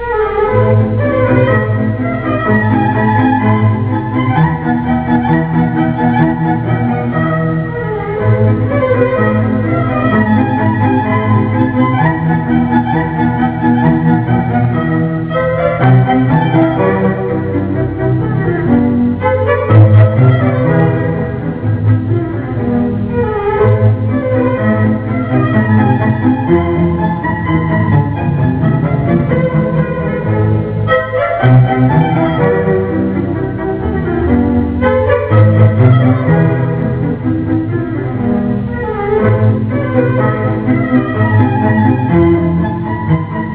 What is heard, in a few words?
Turkish March; Discover Turkey